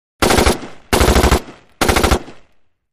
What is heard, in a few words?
M-14 Semiautomatic: Multiple Bursts; Three Short Automatic Fire Bursts; Crunchy High End Bursts